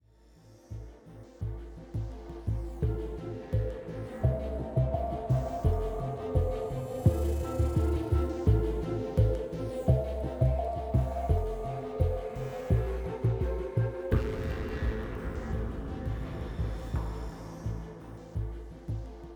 Military High 85bpm

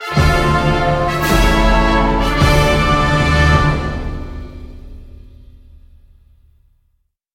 Big Orchestral Lose Fanfare

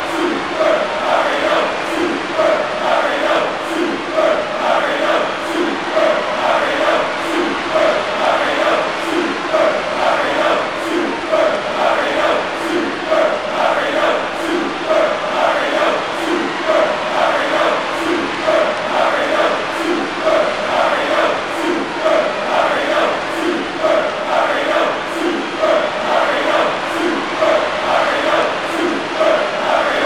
Crowd Song; Mario's Crowd Song; mario strikers charged gamerip

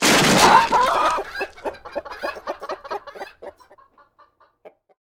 Chicken Coup Break

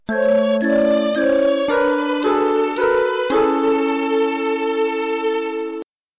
Game Over; Hisyou

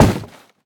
hit wood; Rapids; scrat

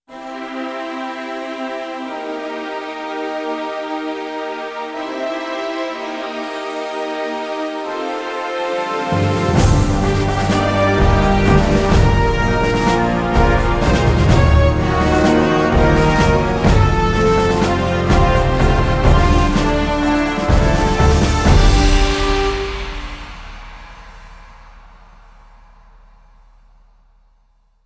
Human Win; Kingdom Under Fire

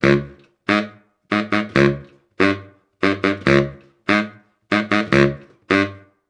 Guit; Bart

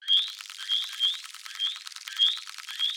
Enemy Boss Dizzy Loop; Smilinguido

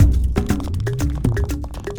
Mus Village Build 120; From Dust; ps3; xbox 360

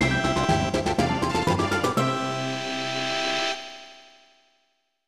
Level Up; Tenshi no Uta 3 Shiroki Tsubasa no Inori

